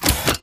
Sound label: Mvmt Servo Head Extend; AI Stalker; Titanfall 2